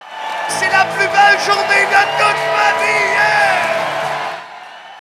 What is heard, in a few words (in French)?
sonnerie